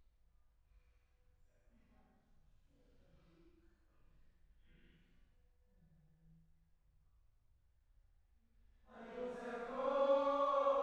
Choir

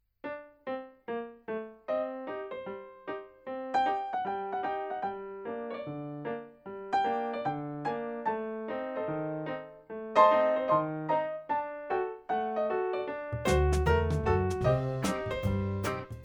RAGTIME Ragtime BPM75 All

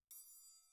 Perc

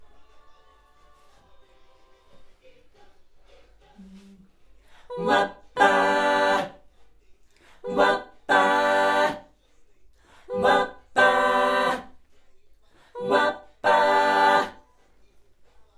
Schoeps AKG, piano

